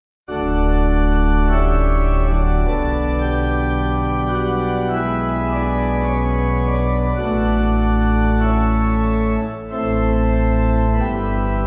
Pipe; O Perfect Love; Felix

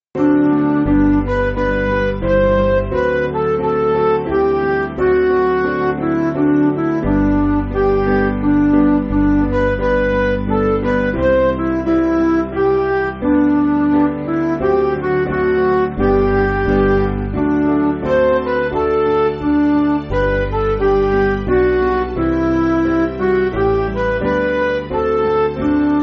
Midi

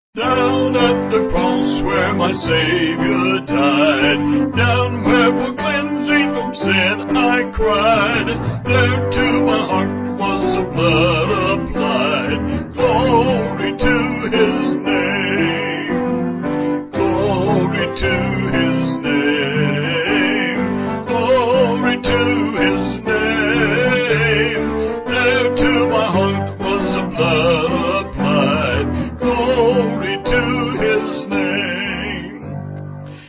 vocals piano

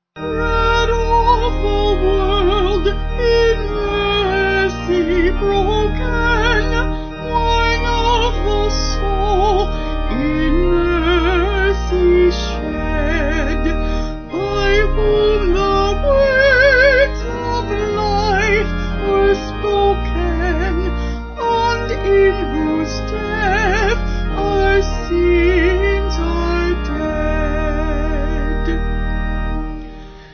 Bread Of The World Eucharistic Hymn Vocal Organ

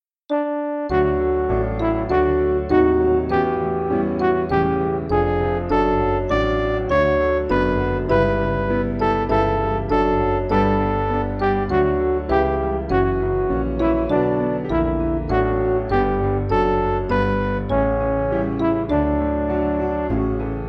Midi

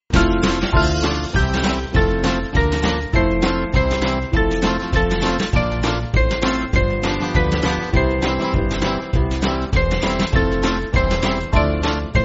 Hail Holy Holy, Band

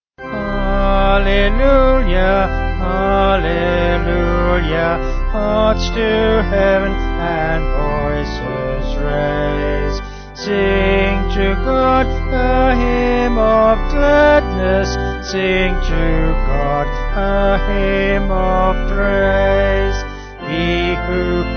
Vocals Organ; Alleluia Alleluia Hearts; Lux; 4/C Vocals and Organ

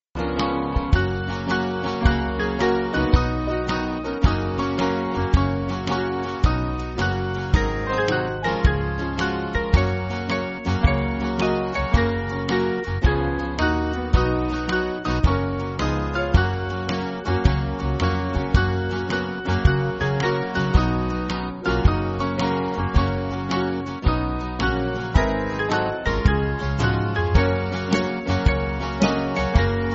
Band, church music

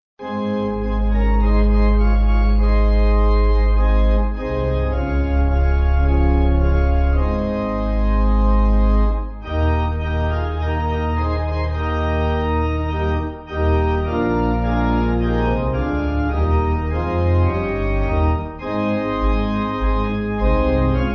Tenney Pipe; Closer To Thee

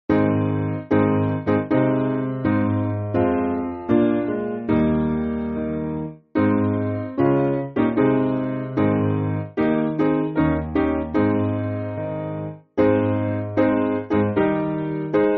Piano, church music; Fight The Good Fight; Job

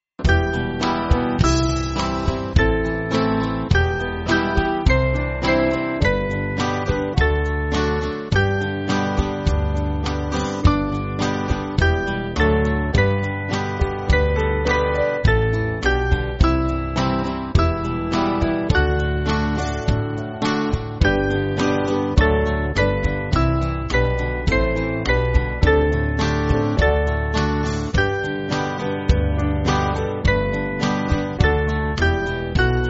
Band, church music; When We Are Tempted; Psa 22

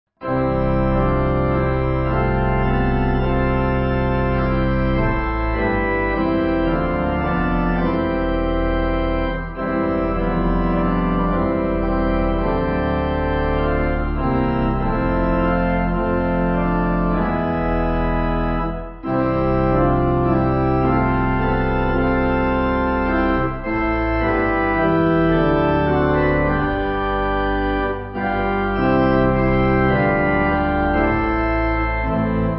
Pipe

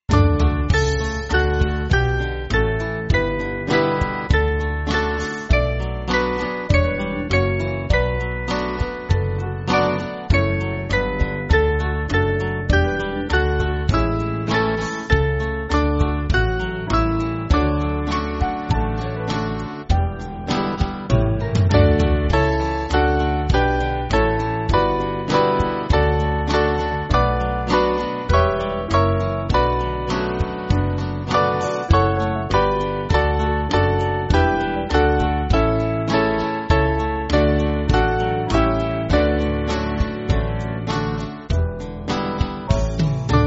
Band, church music

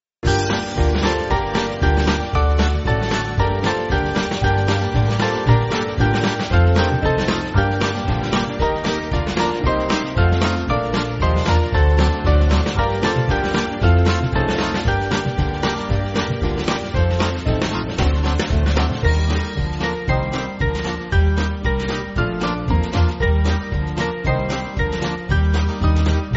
Band

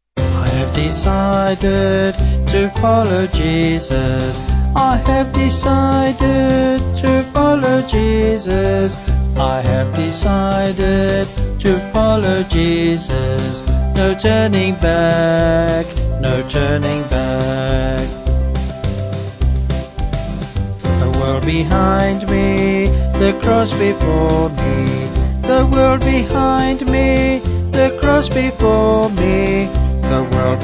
I Have Decided Assam Vocals Band; 4/C-Db Vocals and Band

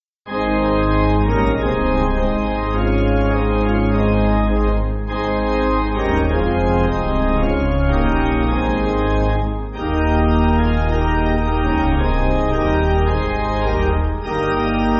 Pipe Organ, Backing Files for Churches

